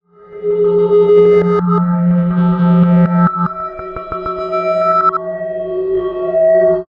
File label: Backwards Melody